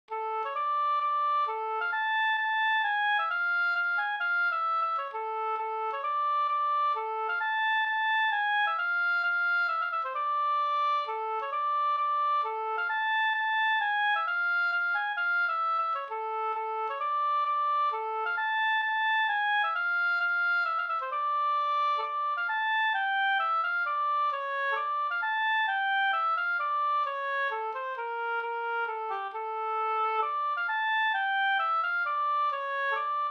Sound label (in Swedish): polska Alvdalspolska; e Simon Simonsson Da